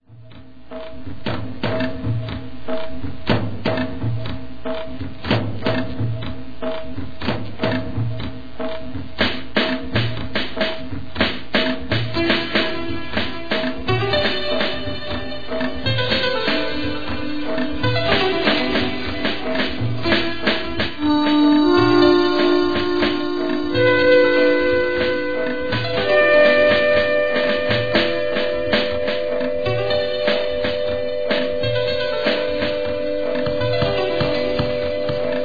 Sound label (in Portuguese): ritmos